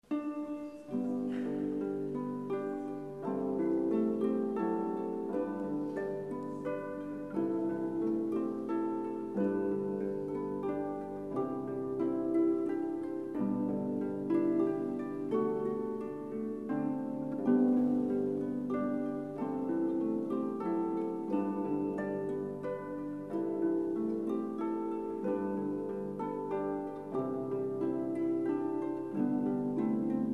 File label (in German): Zwei Harfen